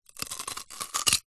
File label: human eat bite potato raw single